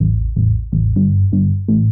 SNTH BASS HOUSE 125 A; eJay

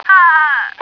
argh; stars; taunts

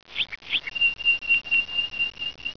AMB

